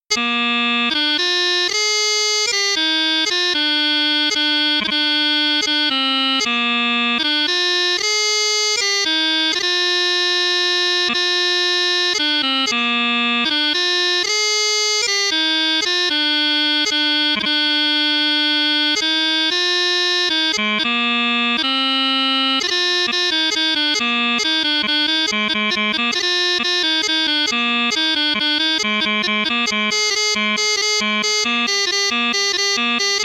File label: Steam Train